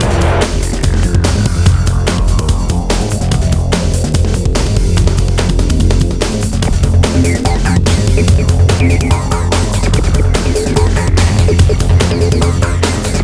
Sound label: Hardcore Breakbeat, 145 Fmin; Big Fish Audio - Hardcore Breakbeat Action; Hardcore Breakbeat Action CD1; 05 Engine